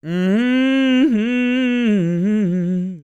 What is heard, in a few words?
MOANIN, Vocal Planet Blues & Soul; ILIO; Vocal Planet VOL-2